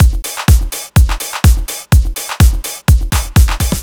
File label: Fill, Drum Loops 125bpm, Nu Dance Electro; Chicago Vibe